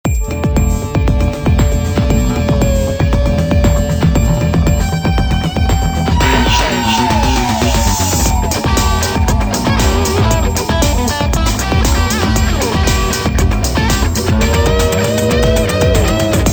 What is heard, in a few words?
Funk